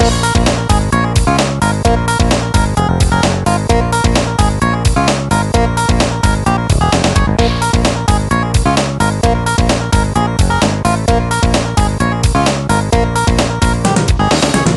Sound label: loop, birds park